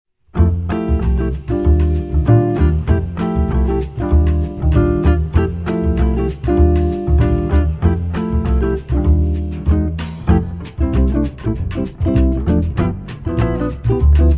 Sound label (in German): Trio bossa uptempo